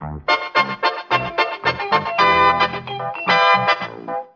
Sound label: keys jinglin; loops; Phonky